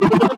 Retro FX; Cymatics; Video Game Sample Pack Vol 1

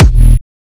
kick oneshot lilmonster F; GhostHack

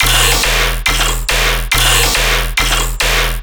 Bass Loop E 140BPM, Dubstep

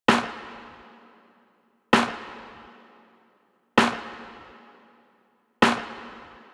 Pop Kit 130bpm Snare; EDM Drums; Drum Kits; Stems; Ghosthack; Drum Mega Bundle; Ultimate EDM Drums; Pop Kit 11

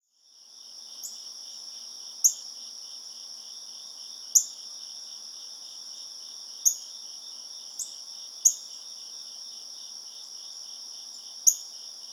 Nature, Ambience

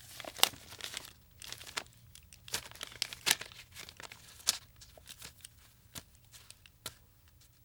Money Handle; Fairlight Sound Lib

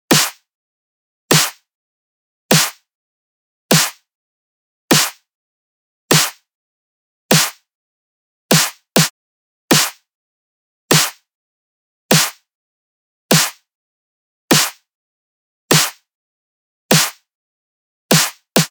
Snare Drum Loop - 100 BPM, Midtempo; Carnage; Cymatics; ANALOG EVOLUTION Beta

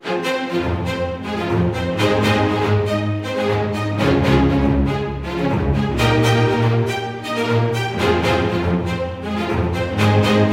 Violin Arpeggio Fm 120Bpm; Construction Kits; STEMS; Ghosthack; SkyRealm; Kit 25 - Vvardenfell

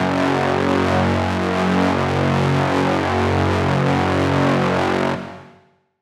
Riddim Melodic Hit F#; One-Shots; Distopian; Ghosthack; Riddim Essentials Volume 2

